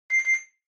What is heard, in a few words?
Washer Front Load Beep Short